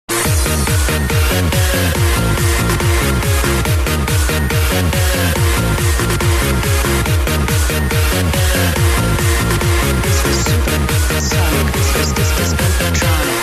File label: Tecktonik